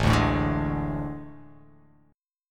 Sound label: A Major 7th Suspended 4th